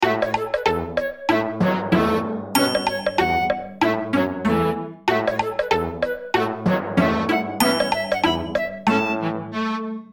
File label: Happy Munchkin Music